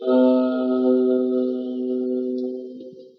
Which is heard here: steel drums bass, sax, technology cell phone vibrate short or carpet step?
sax